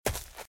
Footsteps - Leaves - Run - Left; RadwinIsland

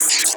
Static; Blastwave

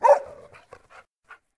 dog close; DeadFright

